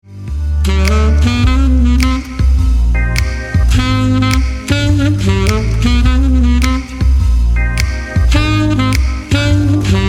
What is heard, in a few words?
ALT SAX Shortie; Cool Cats